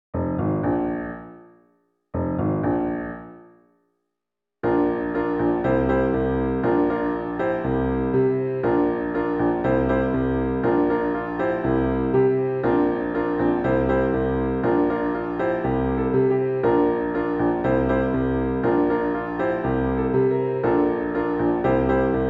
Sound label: ACCOMP